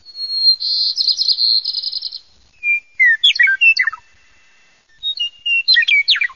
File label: Morning Birds Sound